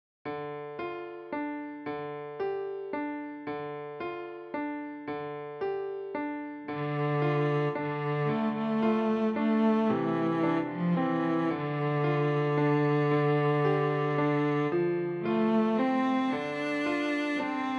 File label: Scarborough Fair